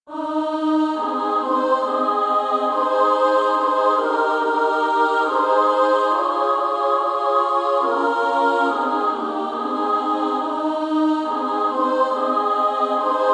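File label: Female Choir SSA 3-part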